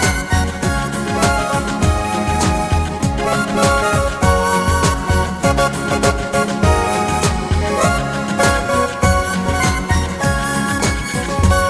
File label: Vallenato Pop